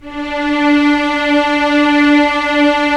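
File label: STR String Section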